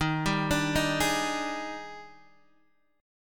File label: D sharp Major 11th, down, Guitar, Half Step Down